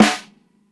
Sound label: SNARE SOFT; Basement drums vol 2